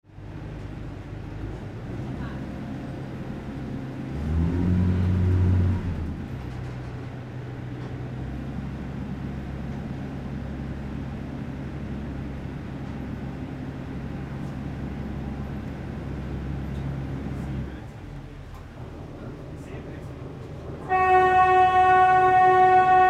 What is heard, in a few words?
boat barge starting and tooting